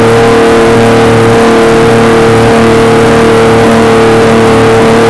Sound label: archer r9 v12, cars; ls1 archer r9; speed dreams